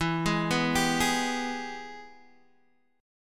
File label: Ab Minor Major 7th Sharp 5th